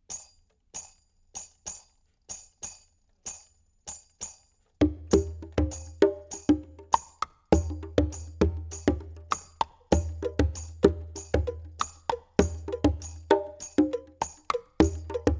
body drum

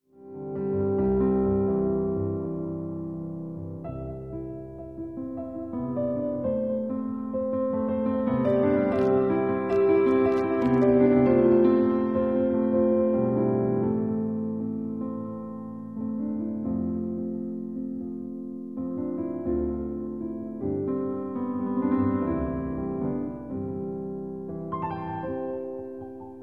piano

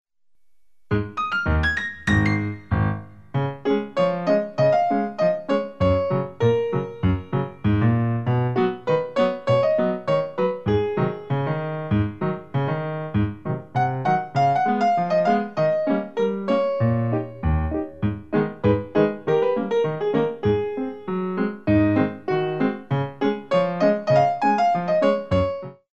degage; greenwood

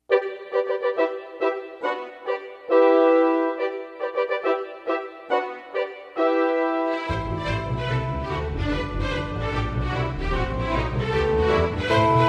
march

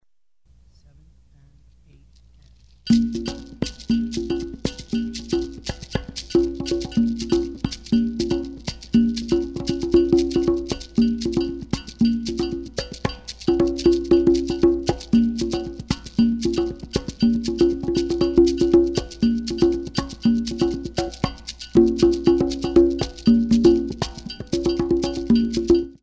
drum

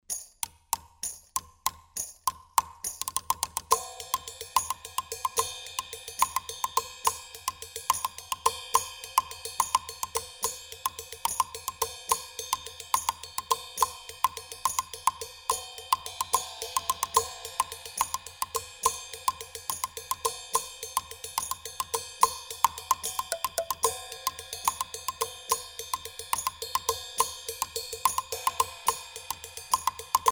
body drum

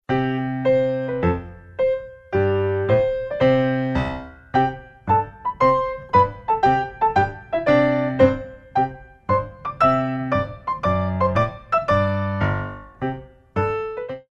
grand battement

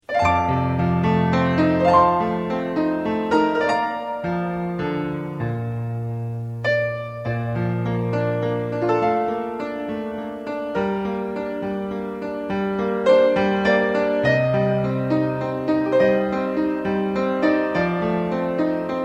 keys rond de jambe

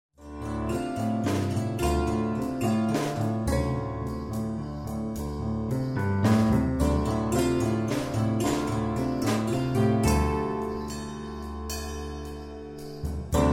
tendus

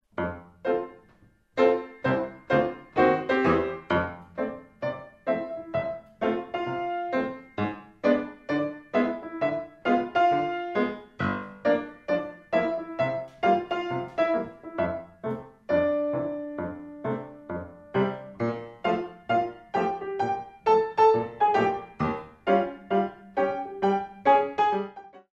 grand battement

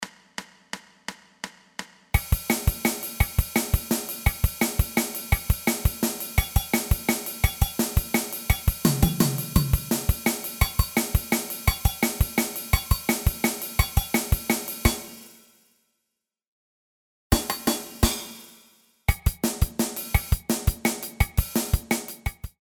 drum for tap

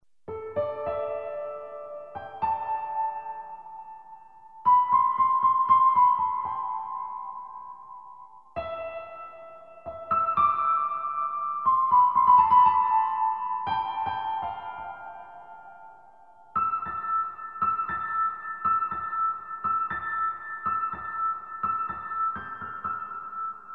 piano

